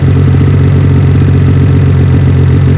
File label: idle, sled; WhiteOut